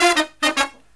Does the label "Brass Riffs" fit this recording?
Yes